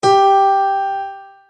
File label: G4 piano; notes